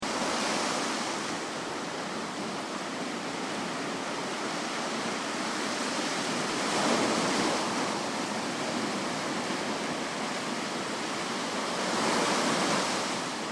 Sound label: Ocean Beach Sound Of The Sea Flood; Ocean - Beach - Sound Of The Sea - Footsteps In Deep Water 2